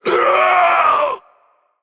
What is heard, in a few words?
Male Undead, Death